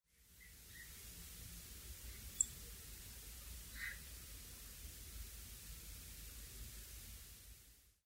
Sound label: song thrush nocmig